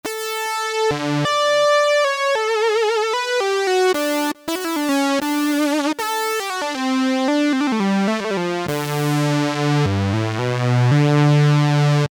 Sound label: Korg MS2000, Far Horizon; Factory Patches